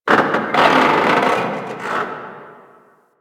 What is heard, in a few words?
Metal Creak, ambience; One Offs; Warframe; Quests; The New War; Drifter Camp